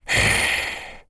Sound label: afflicted aggro